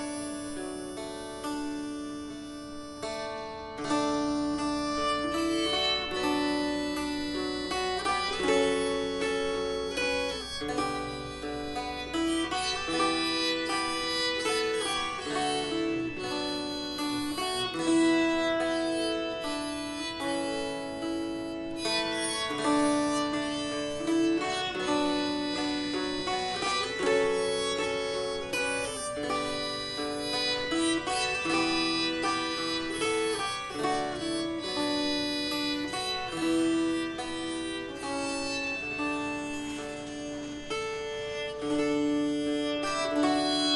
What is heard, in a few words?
Away in a Manger Jam, bowed psaltery